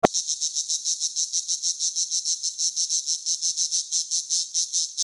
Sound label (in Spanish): cigarra